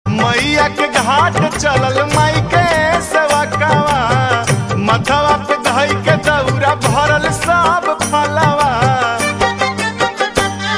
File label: BHOJPURI RINGTONE